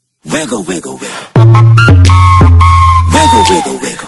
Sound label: Trumpets